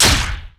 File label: basic attack human; LoL; shyvana